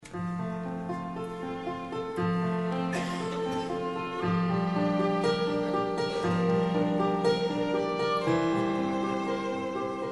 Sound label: ave maria soprano; Ave María – Gounod2; BENDICION DE ANILLOS